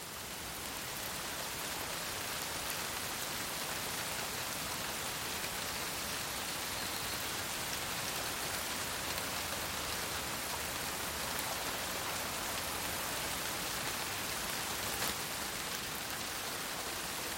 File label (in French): pluie fine; mirumiru